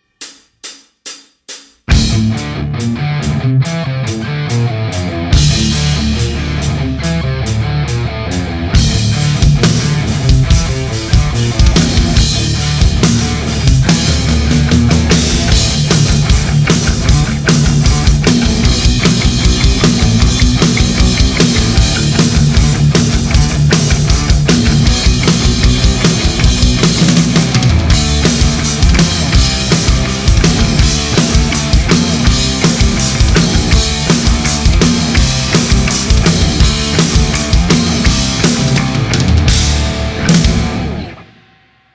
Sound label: Solo Backing Track